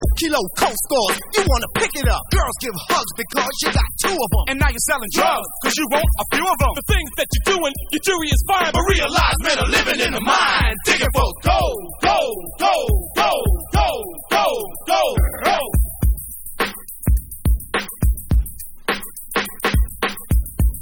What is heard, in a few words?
Acapella Version